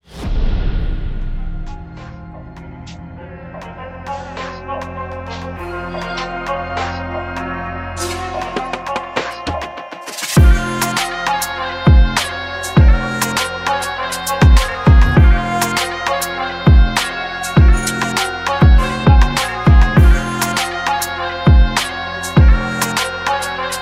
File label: BEAT